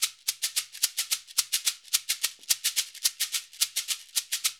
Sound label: LOOP, SHAKERS, Percussion